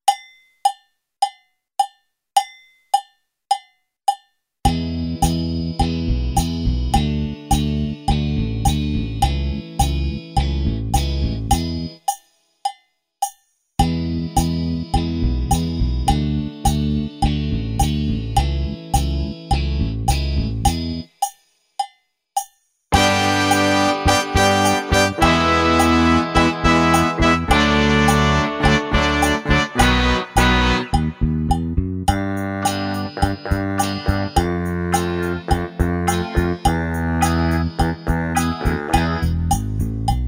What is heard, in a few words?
with click; Drum Kit exam